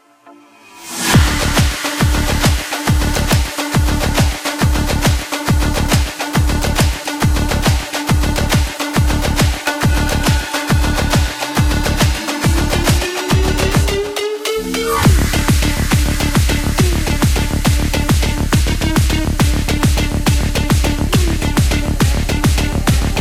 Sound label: Rave; Barotrauma; DynamicEuropa; Red Alert